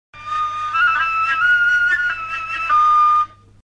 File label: Bayati tetra chord, a “Bayati” Tetra chord on the upper Re (D2)